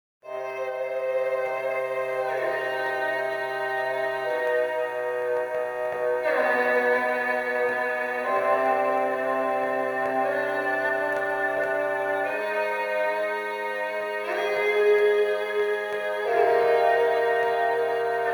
THEME strings; 39steps

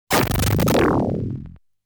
Player Explosion Sound; Zero Wing

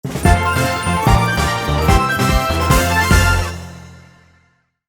Minigame Victory; Mario Party 5

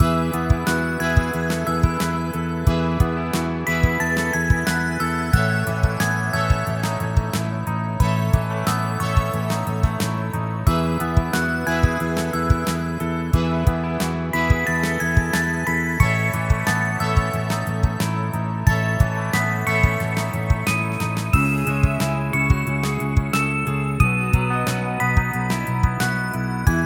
Landing Page Loop; Tax Fugitive

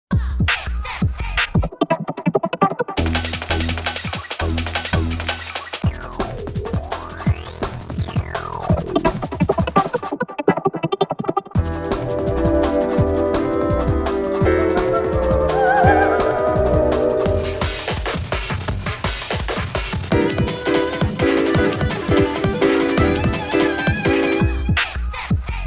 Techno; Kid Pix 3 Deluxe; Windows

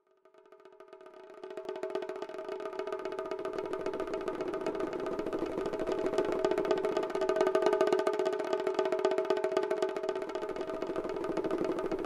Shime Daiko; here comes niko original game soundtrack 2021

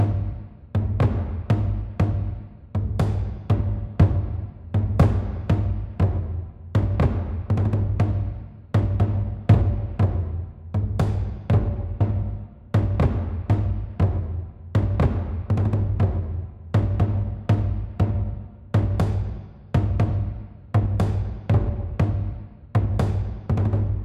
Musical Drums Big; conan exiles gamerip 2017; Darfari

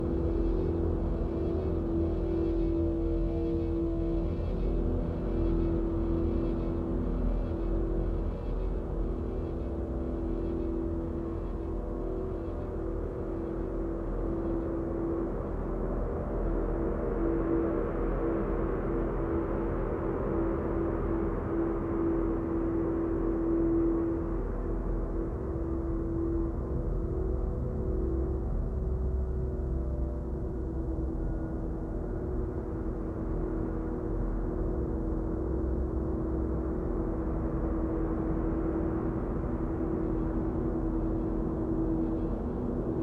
drone

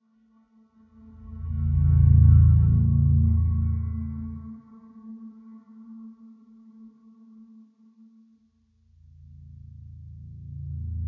Tension Low; Mad Max

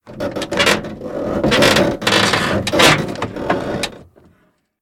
Taking Out Pipe

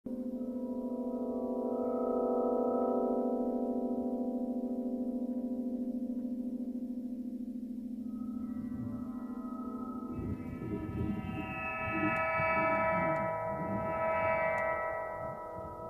Wind Plays Harp; Only If; Windows

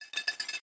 Metal Kili Kili; Lucius III; pc linux

